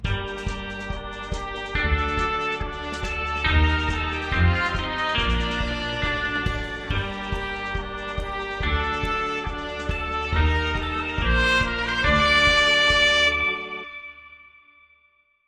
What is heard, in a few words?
No Strings